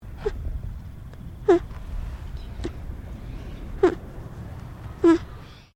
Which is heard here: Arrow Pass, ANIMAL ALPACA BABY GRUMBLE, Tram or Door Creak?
ANIMAL ALPACA BABY GRUMBLE